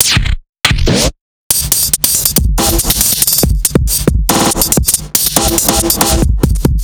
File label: drum